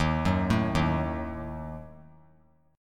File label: Eb Diminished; Ebdim arpeggiated; JGuitar